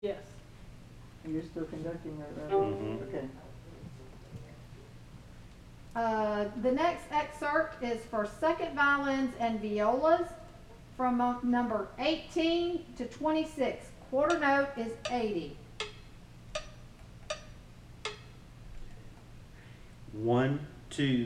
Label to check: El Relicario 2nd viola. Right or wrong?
Right